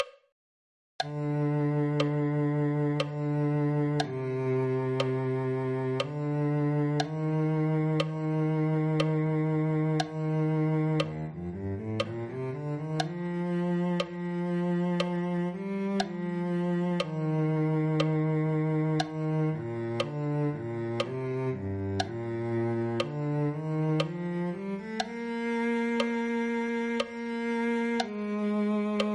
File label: cello